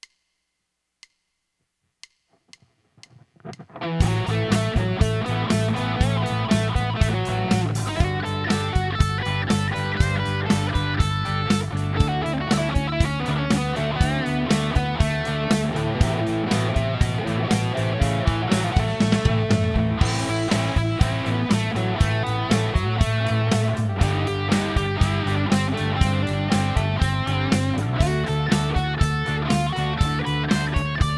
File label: Rock Solo